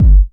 KICK, Tekno Industrial; East West

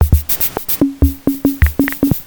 Electronica Rhythm

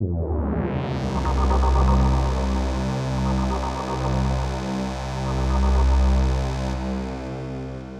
Synth loop (120BPM); musicradar

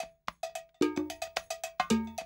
110, Classic Drum Loops

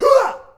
"HUAH", SHOUTS; Best Service; Hyper Dance

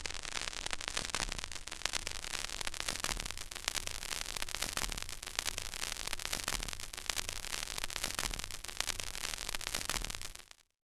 Vinyl Noise; Roland BK-5

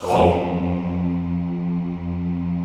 HOMMM F1 R, Extended Classical Choir; Best Service

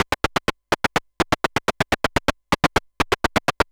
SH101 Clocked LFO Rhythm (125BPM); noise hiss crackle

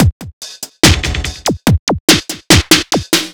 Crunch Fill, Drums Beats

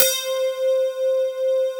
C4, Synth; DALLASTY; MING DYN

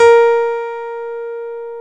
PNO, Electrics, Grands; Club-50 - Foundations

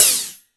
High Hat, E-MU Pro-Cussion

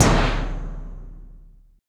BANG, Impacts, Hits & Booms; Distored Reality

